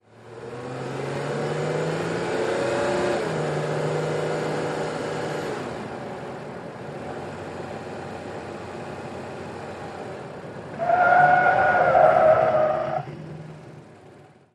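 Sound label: SUV Truck 180 Skid Mix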